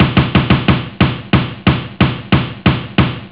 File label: roll, drums snare; OctaMED SoundStudio V1